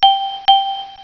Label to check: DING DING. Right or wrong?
Right